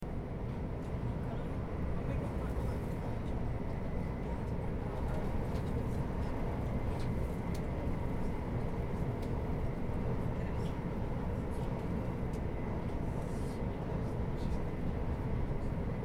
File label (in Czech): vlak Londyn Brighton